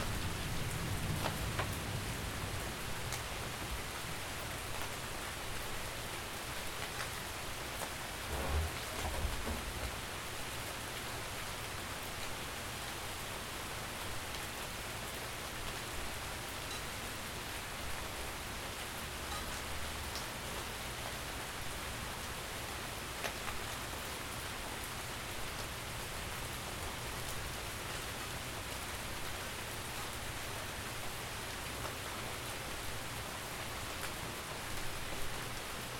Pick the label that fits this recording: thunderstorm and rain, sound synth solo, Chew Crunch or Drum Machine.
thunderstorm and rain